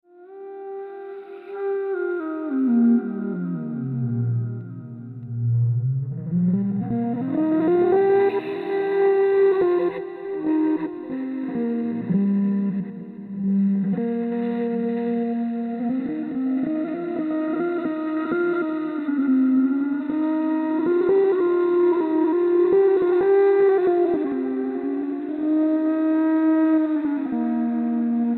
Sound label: Dirty Flute Solo; Beds